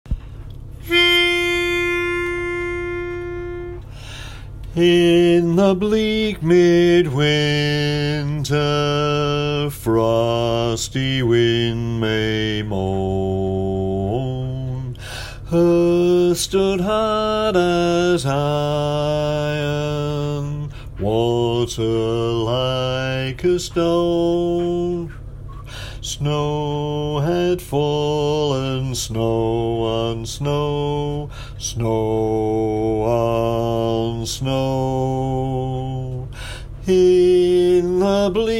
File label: Bass